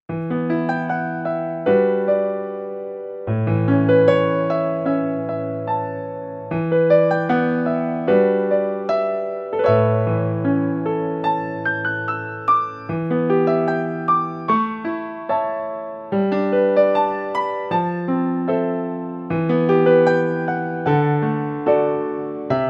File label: Vox Continental Piano